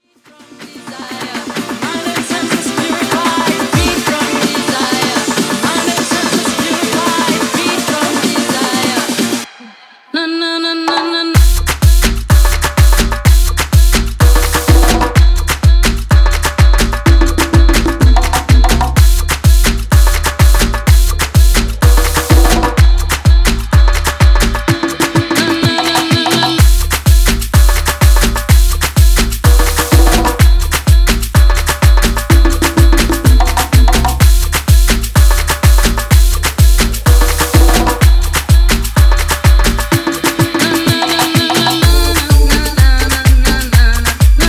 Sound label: afro remix 126 bpm